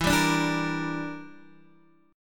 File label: E Minor 7th Flat 5th E, Guitar Open D